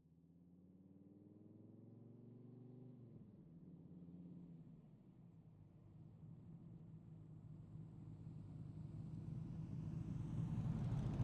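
Dodge Viper GTS Race Engine exterior medium Drive Various Overhead; Dodge Viper GTS V10 Race Engine 2014; Pole Position Production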